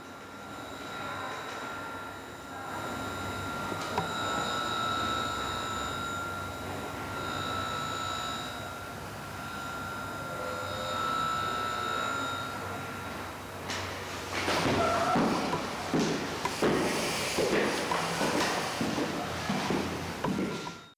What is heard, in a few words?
stairwell Sonic